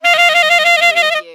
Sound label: Cartoon Clarinet Fluctuate